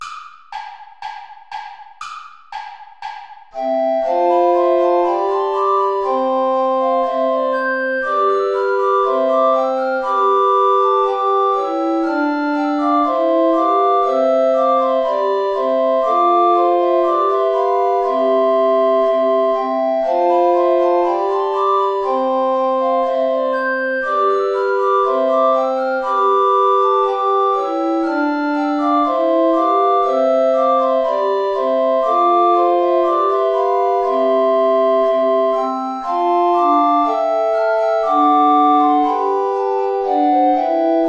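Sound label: bourrée play along without alto recorder; praetorius michael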